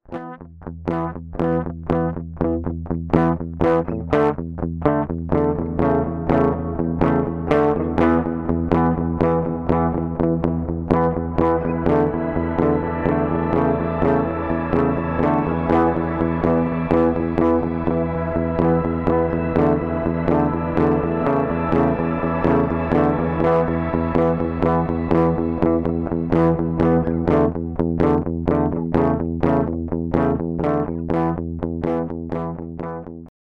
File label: Plate Shimmer on off; Nobels REV mini